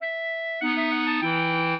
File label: minuet, clarinet; Mozart